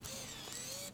Money Vending Machine

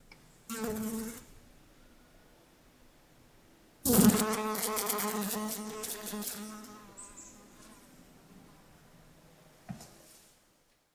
House Fly Close Buzzing Event

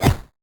Hit 8 Bit

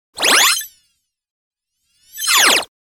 Cartoon Siren Whistle; Soundscalpel Com

